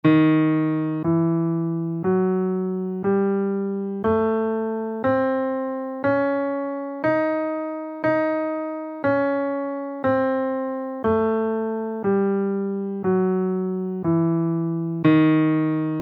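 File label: superlocrian natural 6 scale in Ebm, guitar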